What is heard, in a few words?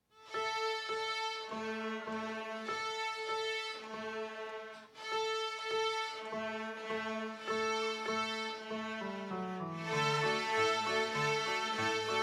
folk dance